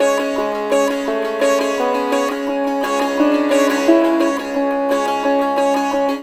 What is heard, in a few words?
SITAR; Spectrasonics; Heart of Asia